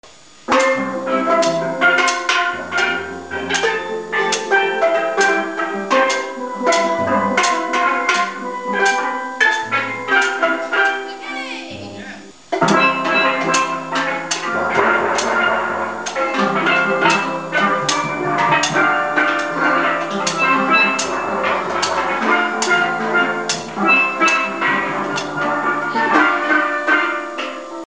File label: steel band